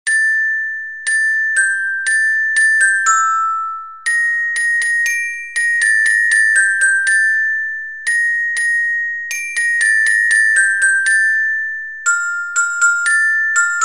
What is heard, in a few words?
toryanse, orgel